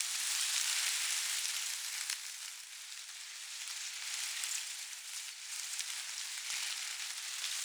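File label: Small Bush Rustle Loop; Warframe; Ambience; NineteenNinetyNine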